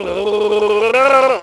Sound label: gargle, negative; Splashdown; XBOX